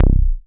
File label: short bass; Masterbits; Dance Trax